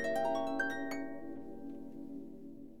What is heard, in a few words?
harp